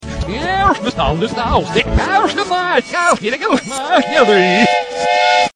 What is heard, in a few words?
clickity clack; reverse